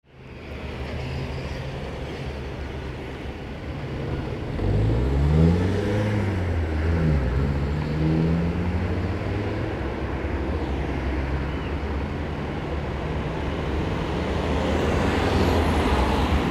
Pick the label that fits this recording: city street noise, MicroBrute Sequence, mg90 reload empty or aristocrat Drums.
city street noise